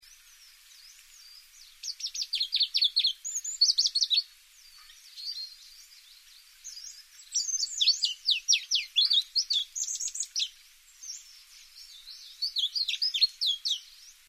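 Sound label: Phylloscopus proregulus, Kiina; Pallas's Warbler; Song, May 2002, Happy Island, Hebei China; Linnut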